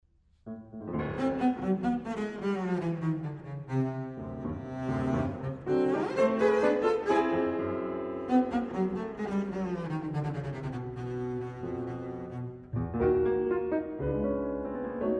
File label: Suite in three movements